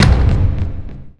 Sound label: treasure count; ui; inventory